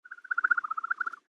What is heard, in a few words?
Firefly Fantasy Flying Around; Aliens; Big Room Sound